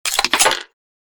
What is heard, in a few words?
Bolt rifle shell ejection; assault rifle